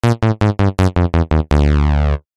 multimedia game sound basic digital retro incorrect error negative